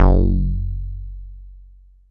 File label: MULTIBASS, Dance Mega Jungle Rave